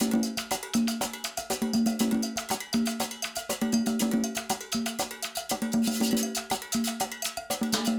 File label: Acoustic Percussion